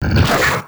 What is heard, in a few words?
Ice Melee ATTACK